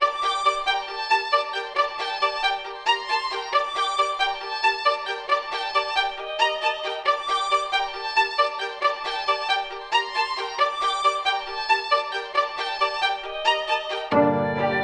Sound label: 136 BPM G Min; Melody; Cutta; Cymatics; Cymatics - 2022 Melody USB Expansion